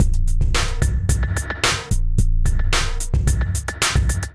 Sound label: Kit groove 110 bpm, Drum; MIDI Clips; Selector Kit Magma; Ableton; Drum Essentials